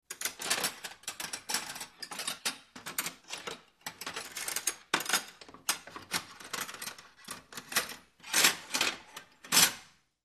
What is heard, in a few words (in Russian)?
stolovye pribory